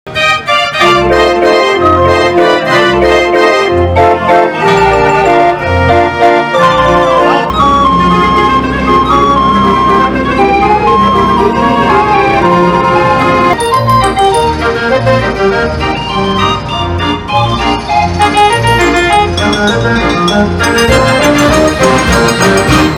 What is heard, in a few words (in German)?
drehorgel